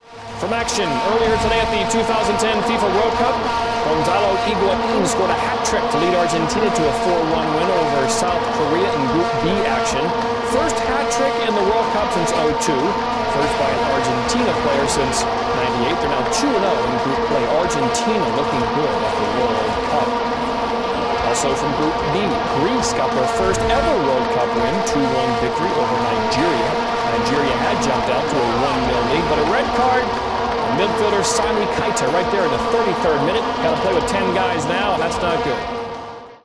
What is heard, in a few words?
Vuvuzela